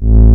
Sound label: Offbeat Bass A; Vengeance Samples